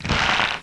Flame Hit; BOOM

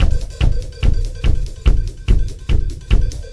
Wah Wah FX, Drums; ACID MUSIC

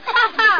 haha

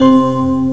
FANT BELL; Groovy Bytes: Behind the Moon

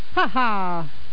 haha

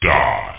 die

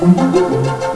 Waltz Vamp; HyperSlots 1.0

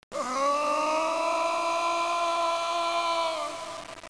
Ahhh

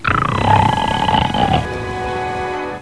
pig; My Animated Word Book; farm

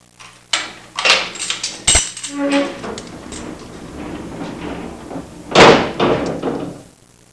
jail door; Sound Effects for Multimedia - Volume 1

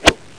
golf hit; PUZMANIA

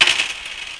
coins; Exile v1.1.3

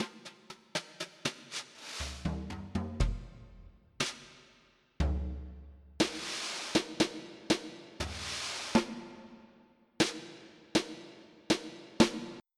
Jazz Traps

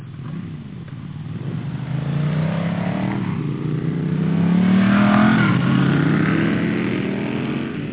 bmw start

